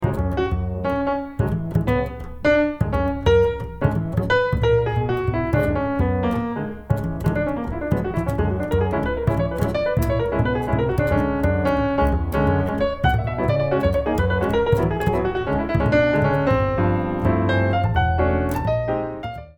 piano solo duo

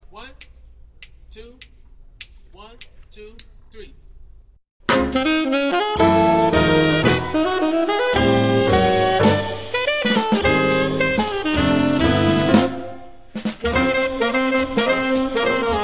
minus bass

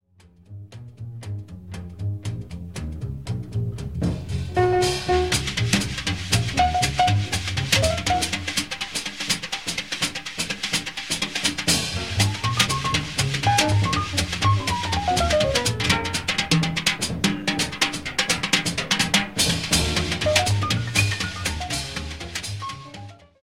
drum

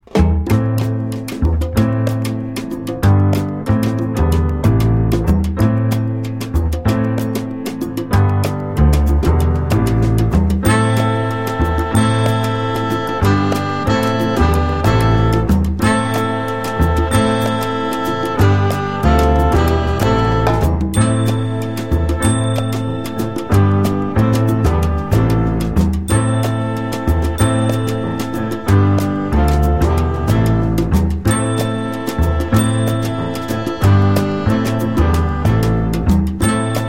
no vocal

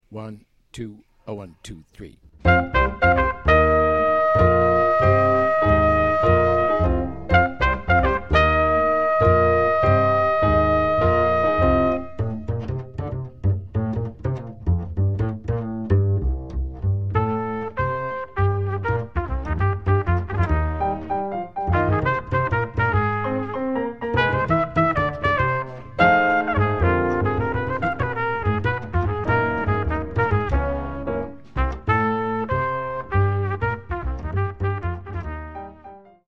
minus drums

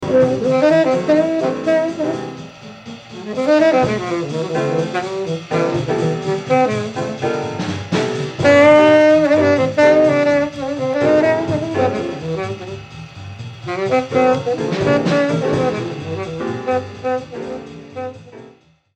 solo, saxes

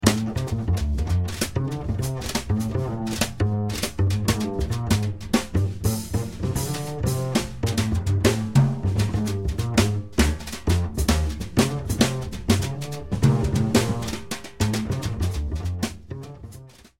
minus melody

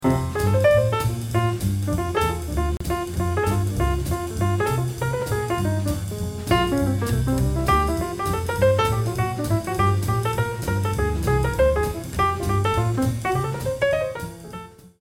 solo

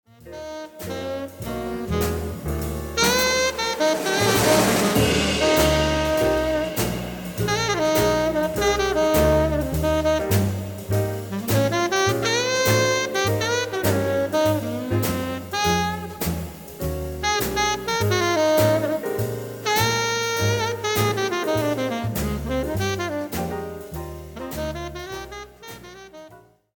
solo

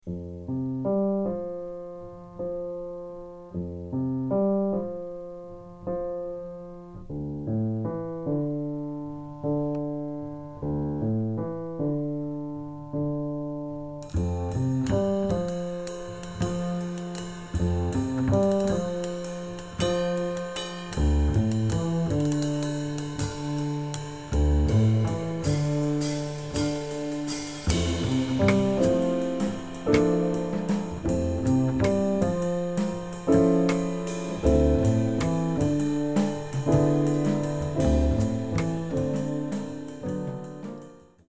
minus melody

